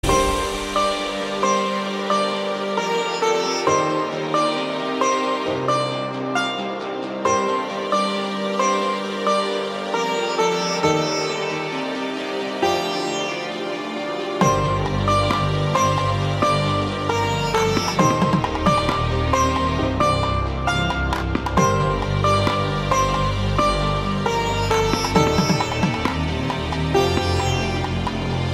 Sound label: BGM Ringtone